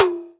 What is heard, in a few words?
STD. TOM, DRY KIT; AKAI S6000 CD-ROM - Volume 1; DRUM RYTHM